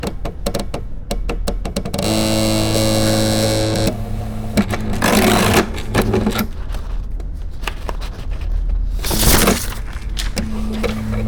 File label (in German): Tinten Strahl Drucker; Fieldrecordings; Brasilien